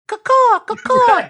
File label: cacaw cacaw